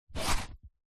foley shoe ballet foot slide in

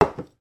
sugar container put down on worktop

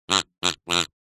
cartoon duck quack

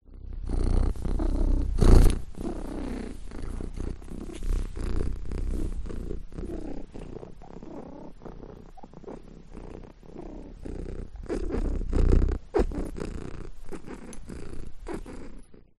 animal cat purr

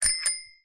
vehicles bicycle bell ring lever; zapsplat

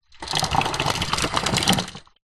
toy train wooden roll surface short

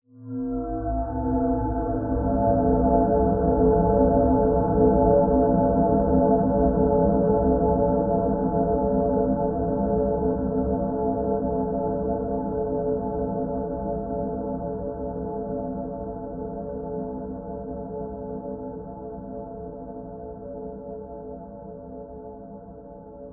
horror swell dark sinister mysterious

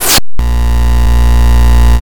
sound design glitch followed by buzz

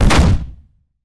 Giant Footstep; Blastwave FX

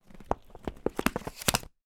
paper pack flick through sheets; foley

